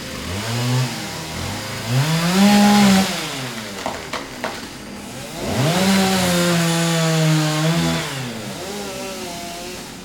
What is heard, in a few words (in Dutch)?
boom knakt onder zaag gewel